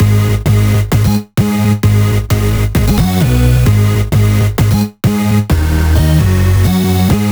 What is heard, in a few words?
131BPM Melody; VFH1 131BPM Summertime Kit